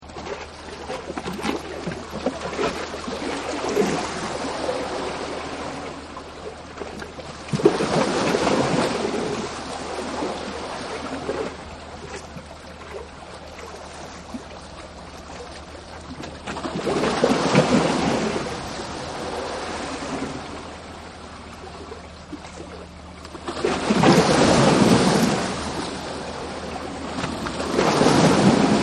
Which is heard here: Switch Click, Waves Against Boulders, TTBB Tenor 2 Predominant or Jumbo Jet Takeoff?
Waves Against Boulders